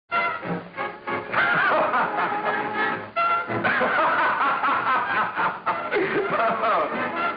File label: reefer laugh